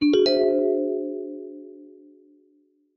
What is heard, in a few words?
musical retro classic vibraphone mystery tone; zapsplat